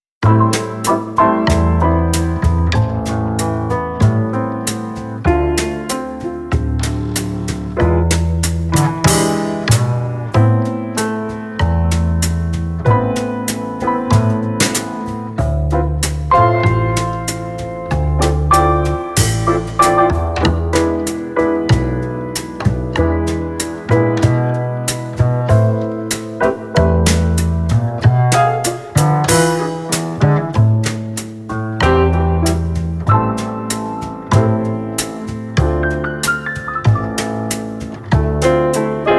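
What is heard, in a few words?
Practice Song Time to Move Along Mid Tempo Jazz, flutist; chapter 5 using the whole tone scale in improvisation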